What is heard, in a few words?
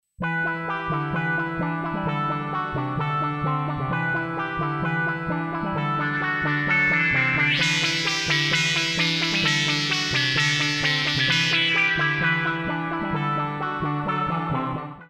lead pad